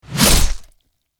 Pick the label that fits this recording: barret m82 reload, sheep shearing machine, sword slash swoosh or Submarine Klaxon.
sword slash swoosh